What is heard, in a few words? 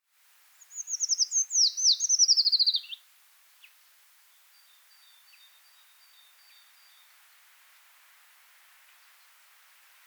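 obyknovennaya pischuha golos, common treecreeper voice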